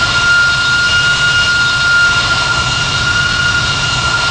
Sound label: x320 spool, CFM56; A32X